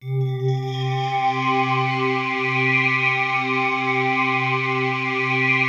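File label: PAD, atmospheric pads; Best Service; XX Large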